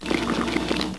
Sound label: Convert Move; AmoebArena